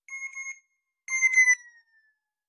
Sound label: message, doppler shifted ringtones